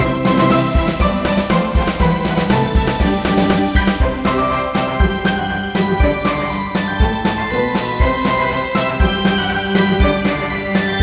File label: Bgm Prize; Fist of the North Star Lost Paradise; Hokuto ga Gotoku; PlayStation 4 gamerip